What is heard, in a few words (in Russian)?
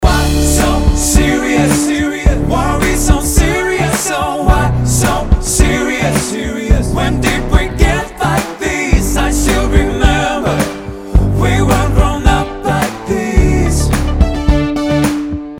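бек-вокал с минусом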